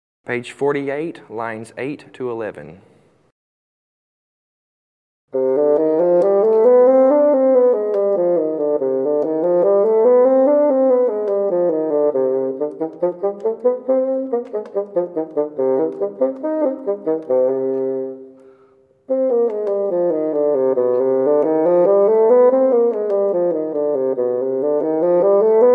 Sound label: Bassoon; Page 48, Lines 8-11